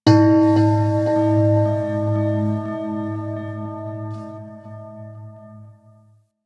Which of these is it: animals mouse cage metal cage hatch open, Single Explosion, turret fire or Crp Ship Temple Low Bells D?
Crp Ship Temple Low Bells D